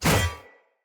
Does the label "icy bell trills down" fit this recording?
No